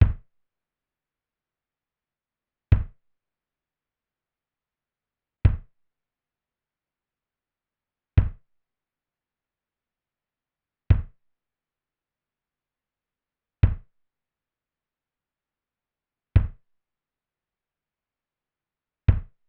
kick mix

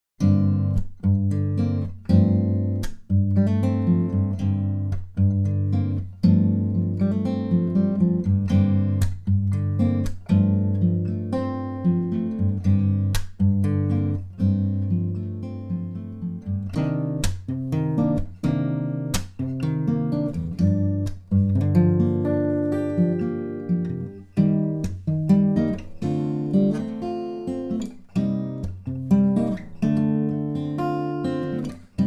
stereo m300 guitar

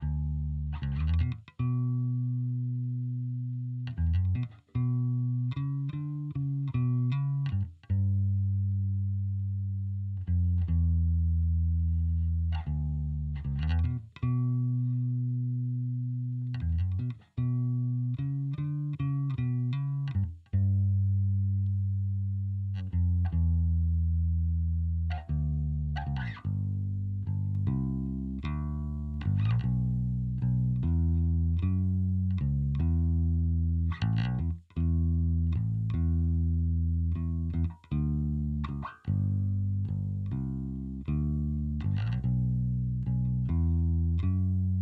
original bass

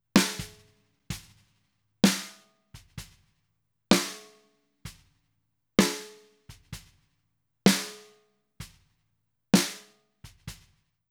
snare clip

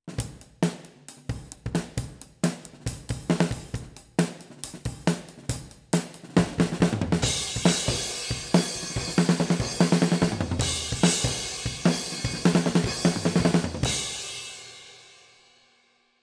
kick distance tunnel oh

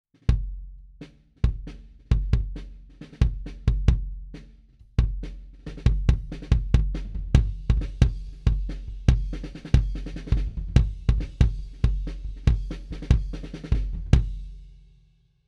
snare mics kick

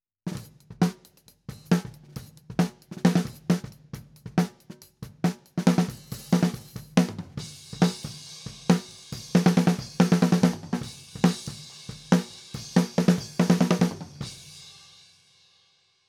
snare top pos 3 inch midway

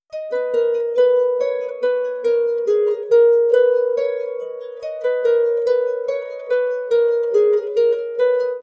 harp dna reduction

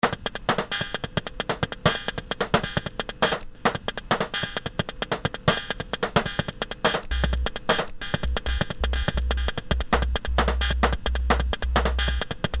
chopped casio

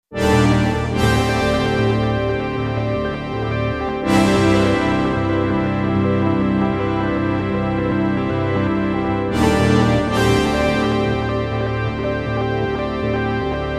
lfo pad

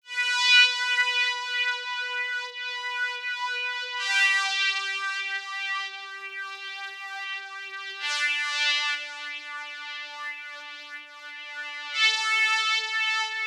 chorus pad

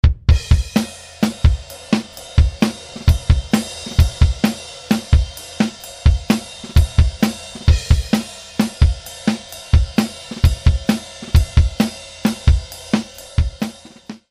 original drums

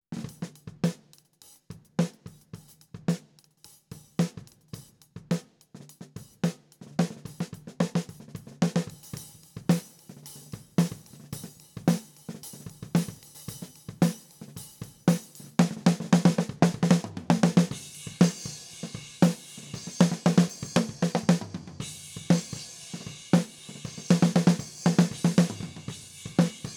kick head on close snr

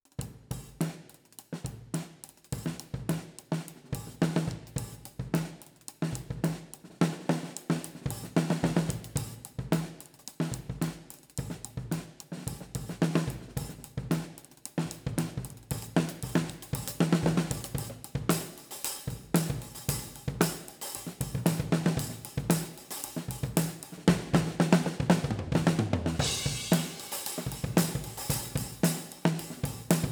kick mics

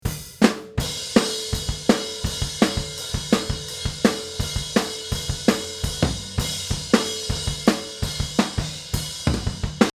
drums before

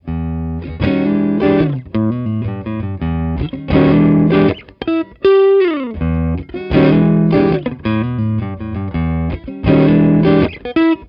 cone centre 0 degrees

